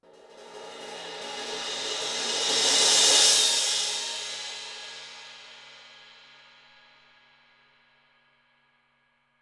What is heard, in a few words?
crash crescendo